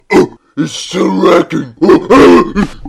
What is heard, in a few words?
death; freak fortress 2; bat metal